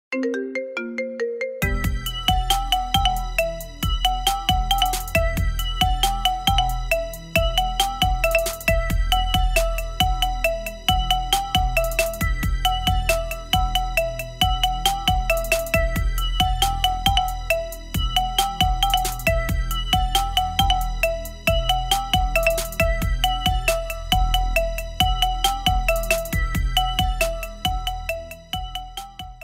Marimba Remix

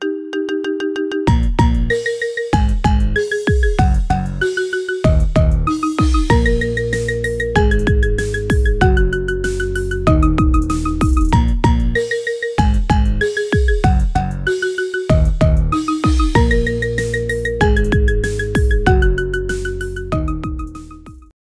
Marimba Remix; Deadpool

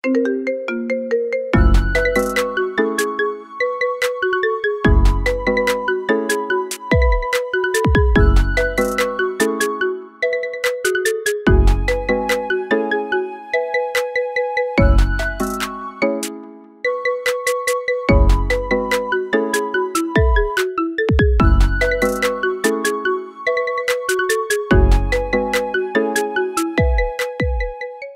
Marimba Remix

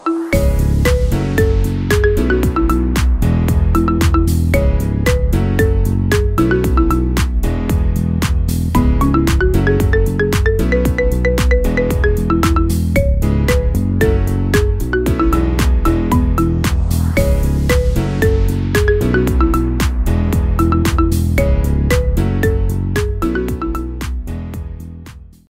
Marimba Cover

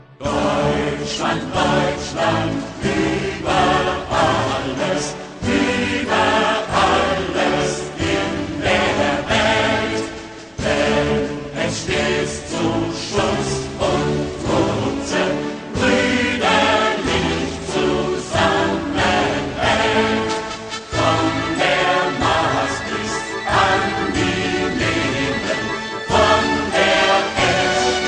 German National Anthem

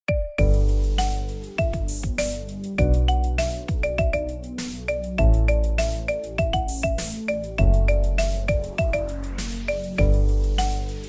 Marimba Cover; Roblox

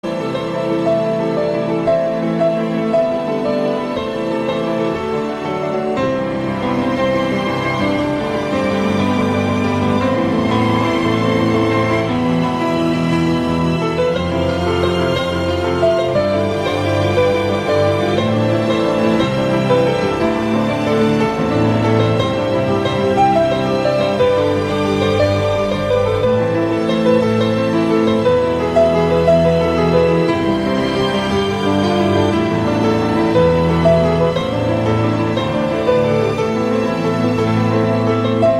Piano

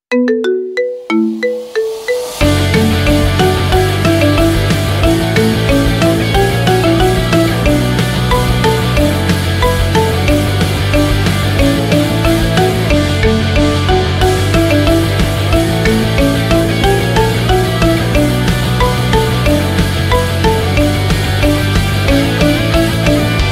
Marimba; Naruto Shippuden

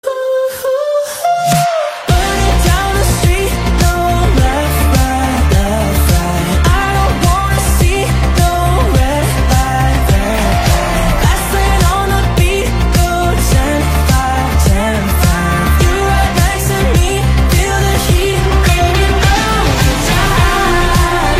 Overdrive

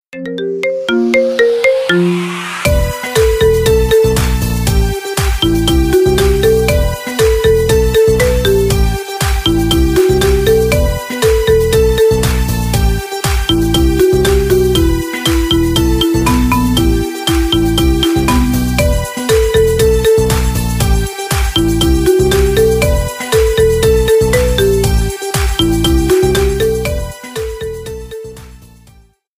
Marimba Remix

